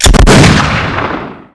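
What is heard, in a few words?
double barrel, weapons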